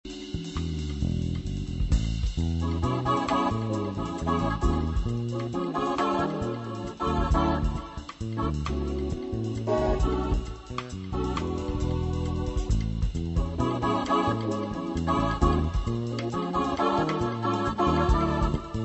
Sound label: Harmonized Melody, harmonisation de; accords de transition